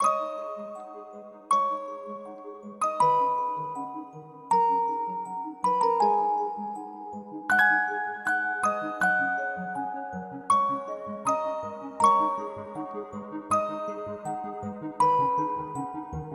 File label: Music Outro 160bpm; Sockventure